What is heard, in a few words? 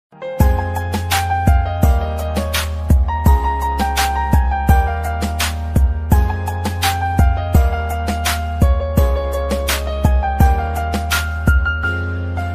new mobile ringtone romantic ringtone love ringtone punjabi instrumental ringtone ringtone